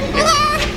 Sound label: Blarp